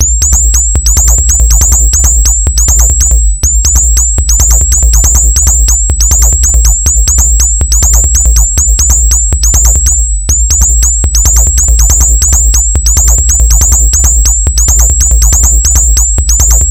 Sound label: Hi pitch